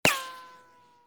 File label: bullet ricochet